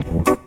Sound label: E stab; funky; Loopmasters; Funky Underground Vol 2